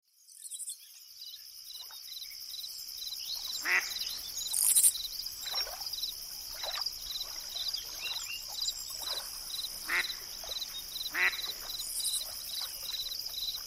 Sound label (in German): LakesideBox Sound Beispiel; Waldsee; relaxound